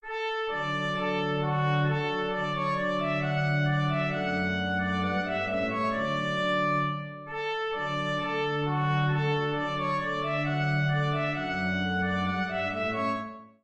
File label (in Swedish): Brollopsmarsch fra Skane visa